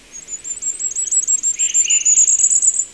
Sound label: Regulus ignicapillus